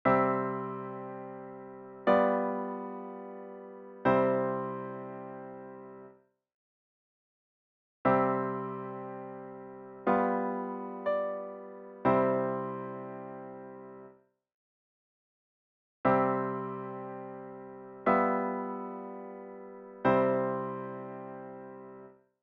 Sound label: suspended Third